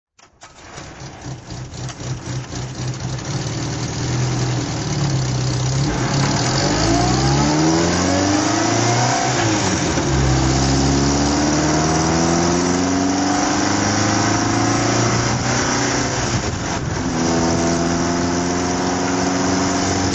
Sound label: TR3 tuned drive